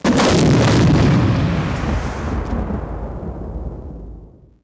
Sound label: explo dome; impacts explos